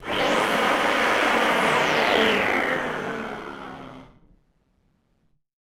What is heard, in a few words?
Malfunction Synth, malfunctioning synth samples